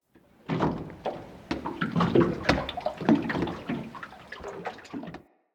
Water Lap Creak Under Dock Slow Deep; Water Laps

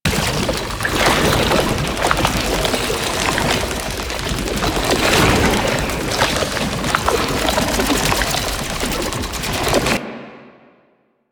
Movement Loop, Foley; Enemies; Warframe; Proto Infested; Babau

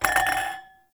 Glasses Clink; Warframe; The New War; Zariman

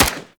Sundial SMG shot; Warframe; Tenno; Weapons

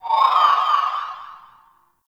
Exterior Distant Space Calls, Ambience; Warframe; Railjack

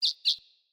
Bird Double Chirp; Pothia Bird Guide

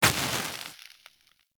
Enemy Burn Death; Warframe; Caliber Chicks Minigame; Weapons; Hit Effects

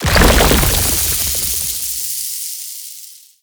Horrors Arm Collapse; Warframe; ManInTheWall; Octopede

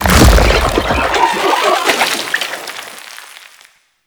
Octopede Unearth Throw; Warframe; ManInTheWall

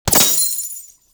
Glass Shotgun Projectile Hit C; Warframe; Tenno

